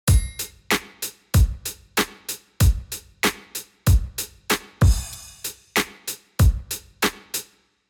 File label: Drum Plate, DiGiCo S21